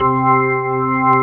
ORG, Organs

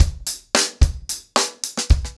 Hip Hop 110BPM, acoustic drums drumloops

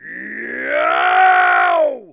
YOW, YELL; AKAI S-Series CD-ROM Sound Library VOL-6

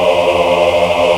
Aahh Chor, VOICE; Best Service Gigapack 1

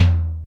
XE8 TOM; Crypton - DrumBase5000

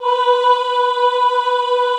AHH B3, Classical Choir; Best Service; Extended Classical Choir

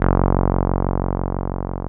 TB 303

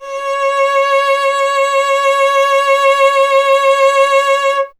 STR cello C#, Cello; Miroslav Vitous; Solo Instruments VOL-1

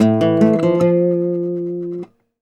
Flamenco, Guitar, a

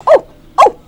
ooh-ooh, Monkeys; E-MU Producer Series Vol. 3 – Hollywood Sound Effects; Water

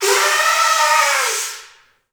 bull blast, Heart of Africa, kudu FX

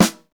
SNR, Roland; Real Snrs

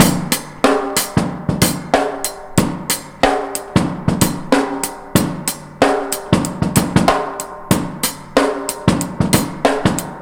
ONE HAND, 99 bpm, Breaks; POTBreaks

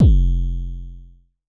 Dist Kicks F1; LookIMadeAThing Kicks

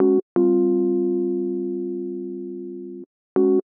Hard Synth Bass A, 125; PowerFX - Nu Jazz House

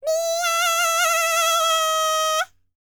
PERSIAN, World Voices; ILIO; Vocal Planet VOL-5

